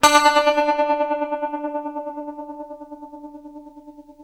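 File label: tremolo D 3, guitar; East West; Steve Stevens Guitar Samples Collection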